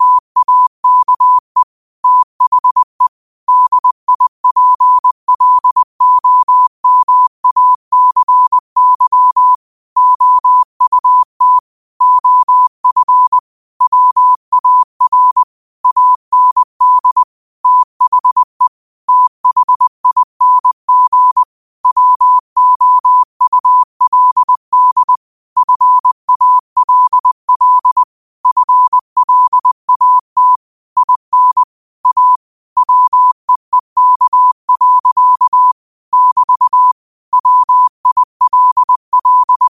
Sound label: 5 wpm, morse